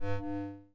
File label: Menu Inspector Window Open, UI Sounds; Custom UI Sounds; Cyberpunk 2077